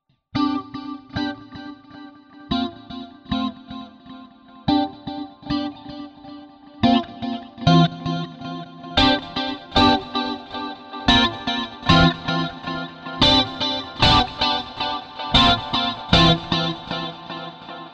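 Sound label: Multi Delay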